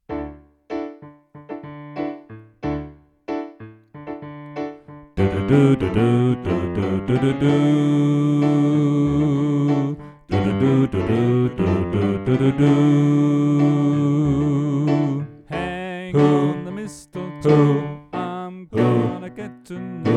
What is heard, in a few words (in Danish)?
Bas; Stephans-koret; oevefiler